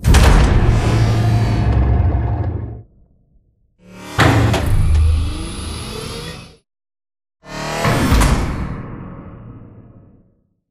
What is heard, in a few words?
Giant Floor Panel Arrive Rotate Lock Mech; Boomtown; Sideways Town; Titanfall 2